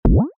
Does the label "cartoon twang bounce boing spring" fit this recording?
Yes